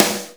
Snare, Akai MPC500

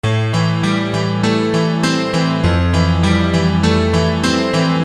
EGrand; Construction Kit; HIP-HOP; Sonic Foundry (Sony Creative Software)

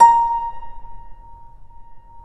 Harp Bb4; Mo' Phatt; Digital Sound Factory Mo' Phatt

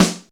Snare A#2, Roland U220

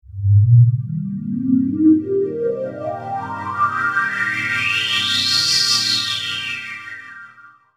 Digi Pads; Jungle Frenzy 1

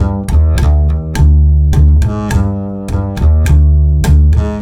Acoustic Bass; Sonic Foundry (Sony Creative Software); Leo Cavallo Bass Taster

